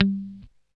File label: GUI G3, E-Gui Muted; Sound & Vision; Gigapack I CD 2